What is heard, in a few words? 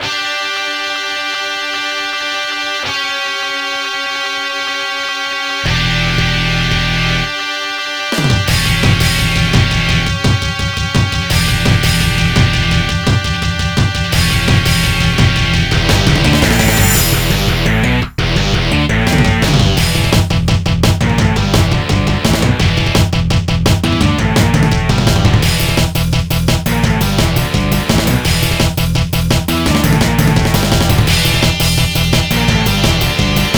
Block BGM; 1500 DS Spirits Vol. 03 Block Kuzushi